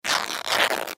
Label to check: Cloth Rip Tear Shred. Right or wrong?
Right